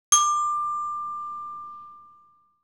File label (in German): glockenspiel (c-dur-tonleiter) pro ton ca. 3 sec.